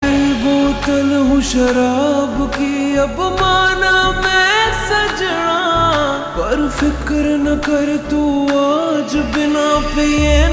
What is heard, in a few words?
Ringtone Slowed Reverb